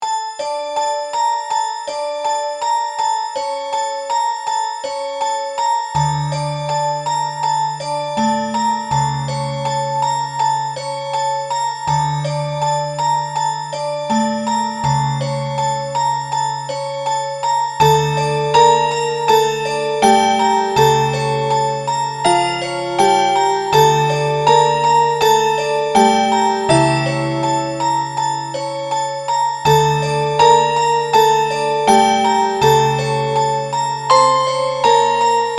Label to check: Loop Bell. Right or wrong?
Right